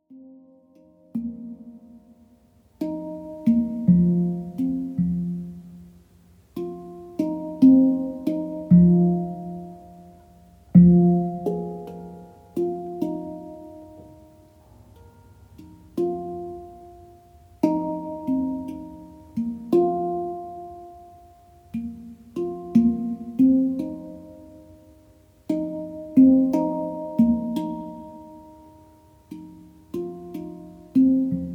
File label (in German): Hang